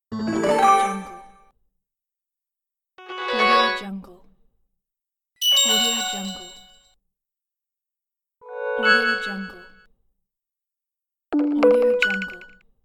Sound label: social media reveals